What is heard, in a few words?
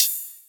Rides, Cymbals